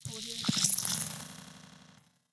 organic horror interface fleshy transition

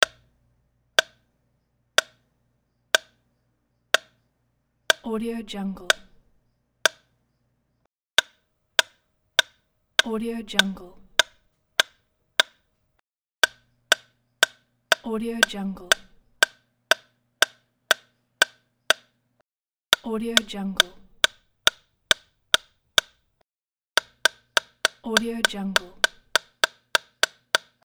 old fashioned metronome ticking; electronplanet

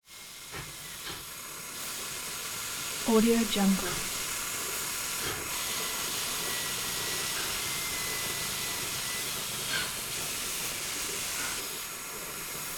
steam engine air hiss whistles some chugs; prosoundfx

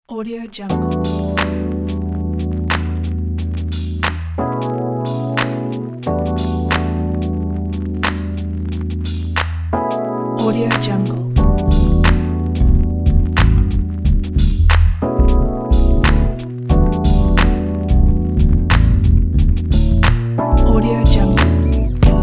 lazy sofa jazz

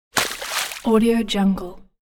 water splash

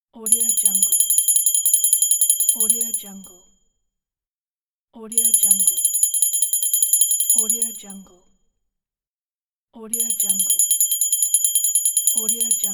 small ringing bell; audiomixexpert

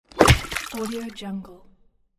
fishing stun fish

